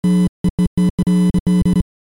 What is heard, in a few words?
Buzzing bursts speakers malfunction; headphones and speakers